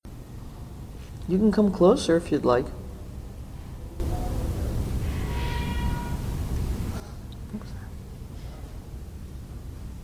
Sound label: a yell or metal